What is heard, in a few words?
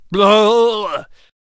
Death Vocals